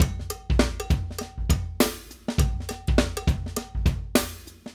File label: Acoustic Live Drum; Platinum Audiolab